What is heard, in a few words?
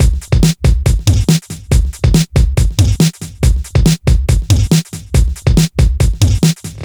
Loops BB 140, BreakBeats; Vengeance Samples